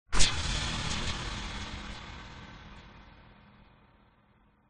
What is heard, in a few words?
cinematic hit loud bright flash scary; sound design